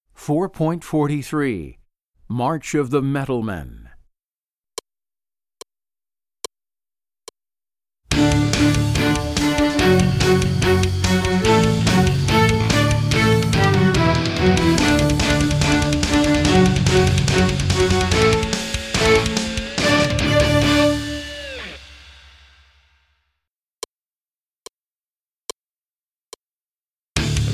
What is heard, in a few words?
March